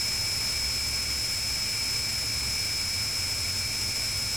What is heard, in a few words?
gas pressure, ambience